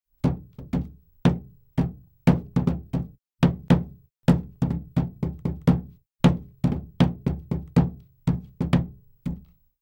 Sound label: Hands Banging on Dashboard; Fairlight Sound Lib